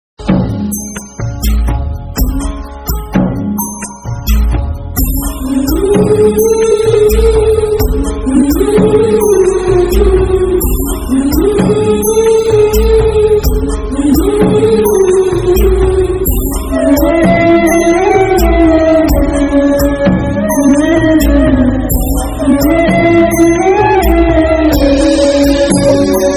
south ringtone sad emotional bgm ringtone; saimadhavbattula

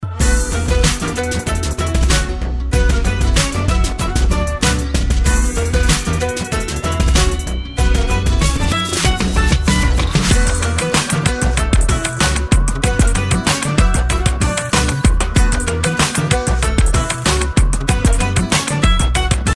Beauty BGM; Meesa; Remo

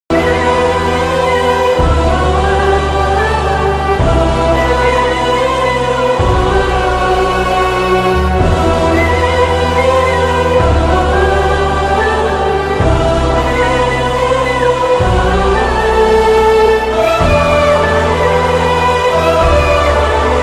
animal ringtone

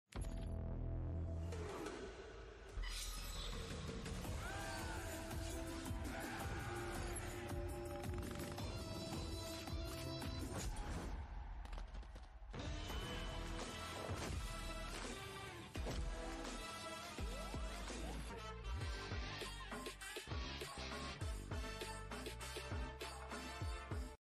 mass bgm ringtone; pakka commercial; gopichand; rashi khann

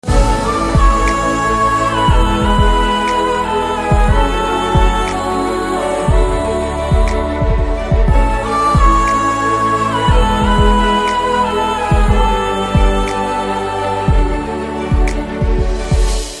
Action BGM Ringtone; Vishal; Azhage

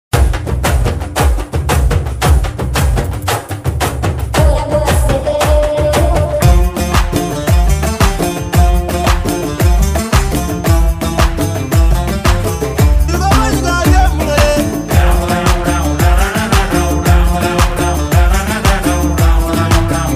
promo bgm ringtone; acharya; mega grace; chiranjeevi

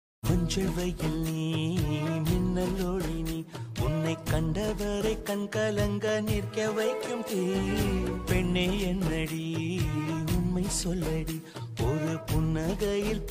love bgm ringtone

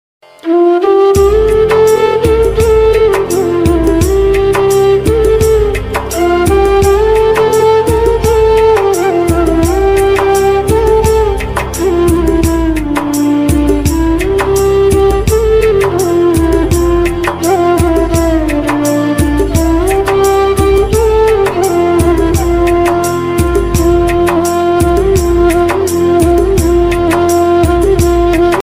flute ringtone bansuri instrumental; krishna flute ringtone bgm music

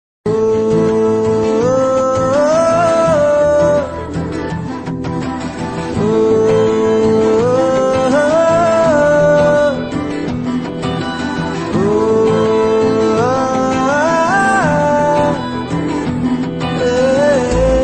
new movie song ringtone; shakib khan; shikari movie

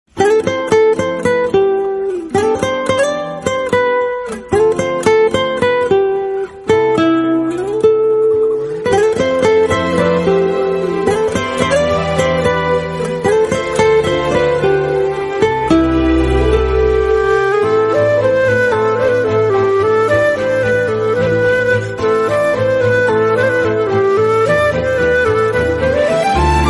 movie ringtone bgm love bgm; dhanush movie

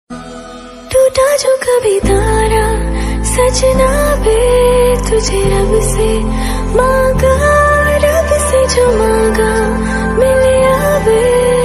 love ringtone love song instrumental

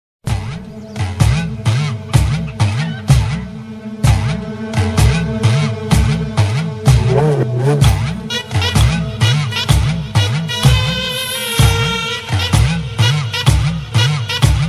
villain bgm ringtone; spyder

